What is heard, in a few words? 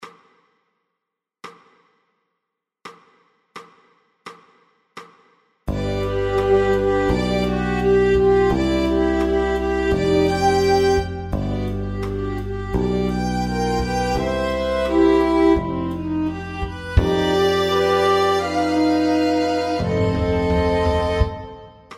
strings